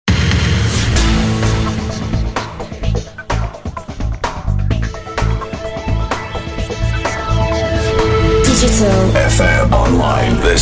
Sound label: newsbeat; BBC Radio 1 Newsbeat, UK; jingle packages